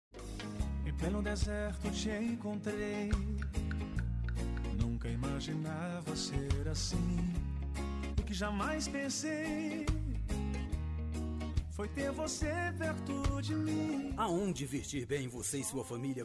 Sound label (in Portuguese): vinheta